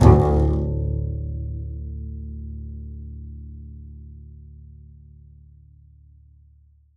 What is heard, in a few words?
pizz noise super d, double bass; dsmolken